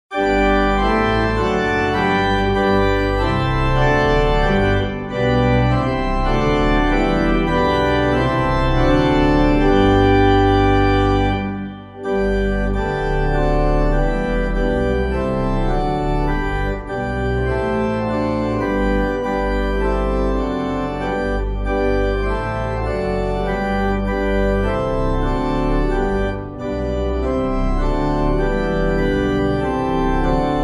organ; Diverse in Culture Nation Race; Duck; TALLIS' CANON; Clyde McLennan

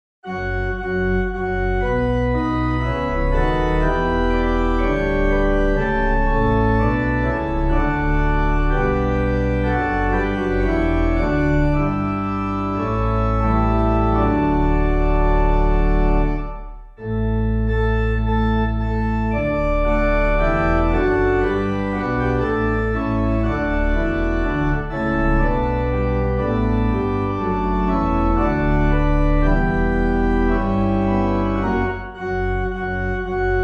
organ

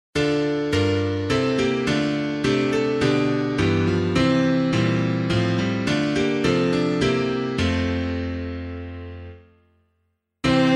piano

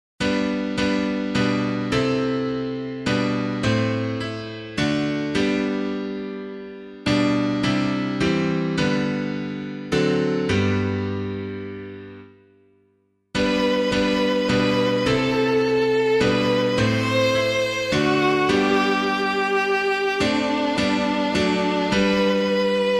piano

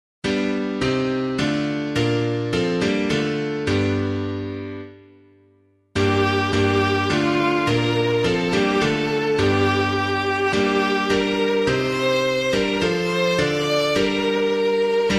piano

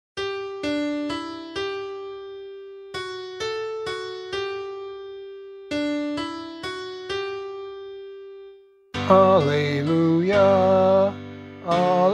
Gospel, vocal

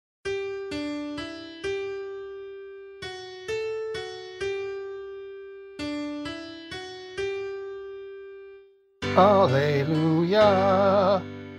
Gospel, vocal

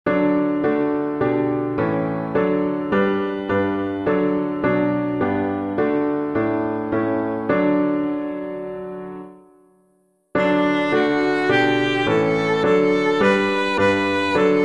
piano, hymn; Awaken Sleepers Sing for Joy; Forster; TALLIS' ORDINAL; Advent

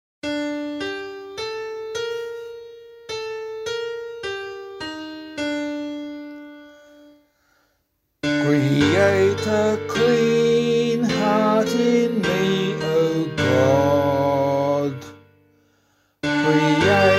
Lent 5 Psalm B - vocal; Sing the Responsorial Psalms

